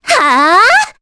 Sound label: Vox Attack, jp; Cassandra; kingsraid